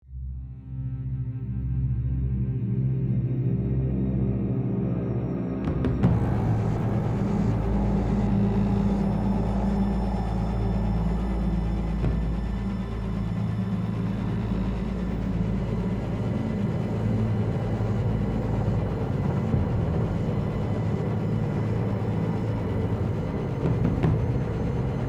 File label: Tense Loop